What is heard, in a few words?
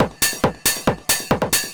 drum loops, Techno, 138; xtra-drumloops